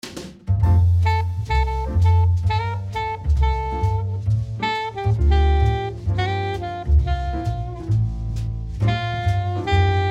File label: QUARTET